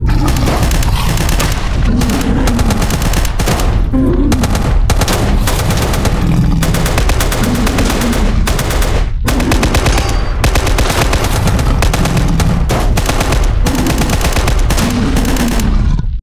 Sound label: fightscene; STALKER